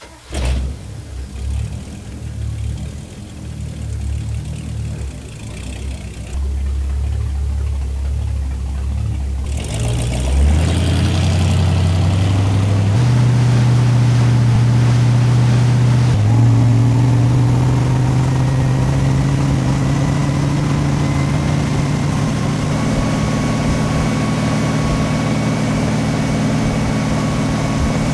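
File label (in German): Polizeiboot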